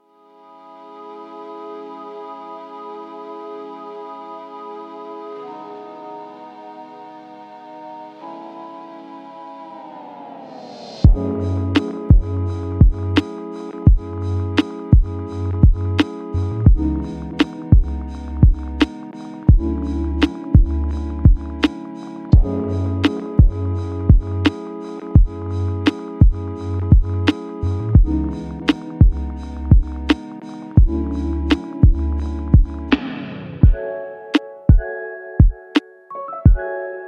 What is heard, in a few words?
jazzy